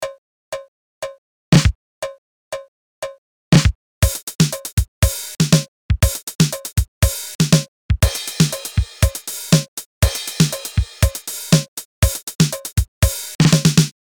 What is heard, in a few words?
TR 707; Roland Cloud